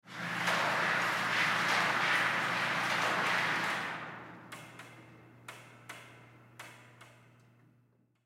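Shooting Range Interior, Shooting Range Rail Slide Back 10 Ft; Shooting Range Rail Slide 50 Ft Back 100 Ft; Miscellaneous Sport; Sports Shooting Range Indoor Target Rail Slide Back 10 Ft Cable Clang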